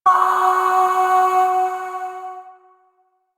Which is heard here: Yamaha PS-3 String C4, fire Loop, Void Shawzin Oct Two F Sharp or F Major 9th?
Void Shawzin Oct Two F Sharp